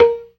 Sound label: PERC, ORGAN; Keith Emerson Signature Series